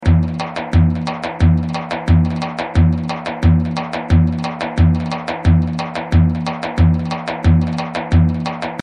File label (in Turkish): trakya bendir; roland gw8 ritim